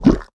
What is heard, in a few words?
gun pickup